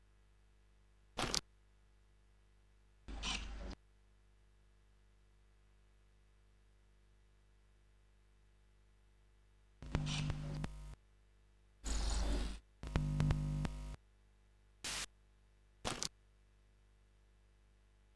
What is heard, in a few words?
stereo loop